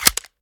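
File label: pistol reload mag in; gmod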